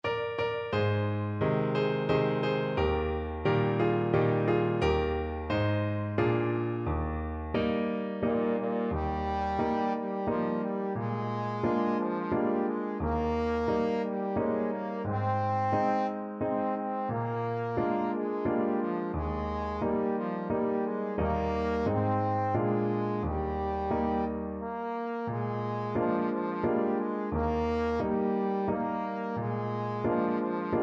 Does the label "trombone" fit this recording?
Yes